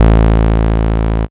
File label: Bass Shot A